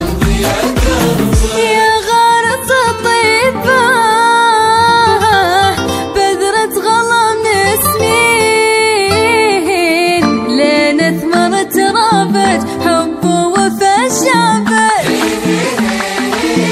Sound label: arabic bahrain ringtone